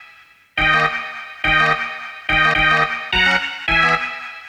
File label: String Hits; Nuclear Sub